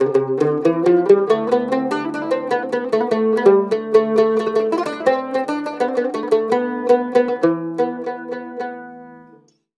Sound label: CUMB, CUMBUS, Mediteranean Traditions